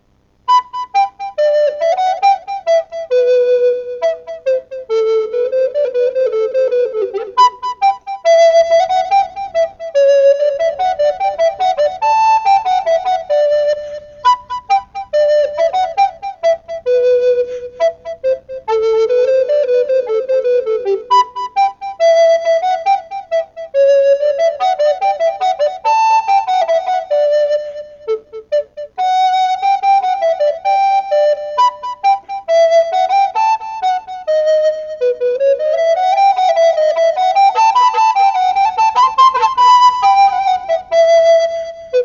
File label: Gavotte in G; MelBay